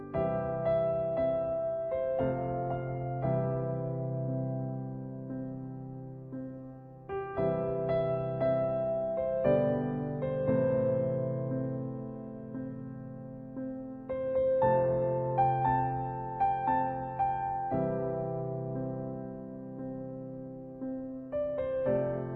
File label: Piano Cover